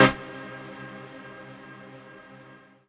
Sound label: HOUSE, House Dance